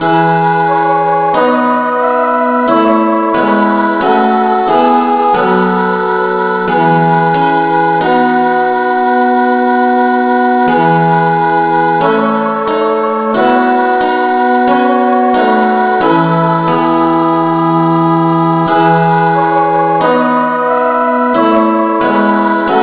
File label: no vocal key e